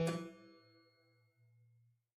Harpsichord stop1 release E2, Harpsichord, Italian; Chordophones; Releases